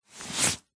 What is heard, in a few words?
foley clothing high vis jacket thick hand remove from pocket